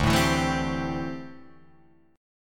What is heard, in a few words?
D 7th Suspended 2nd Suspended 4th D, Guitar Drop D